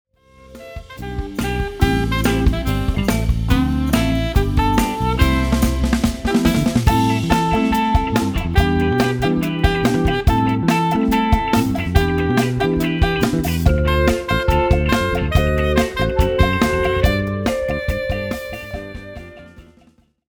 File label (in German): Alt Sax